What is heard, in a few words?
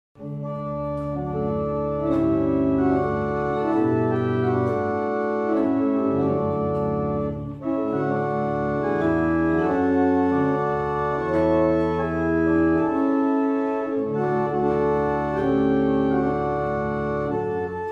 barrel organs; Brightwell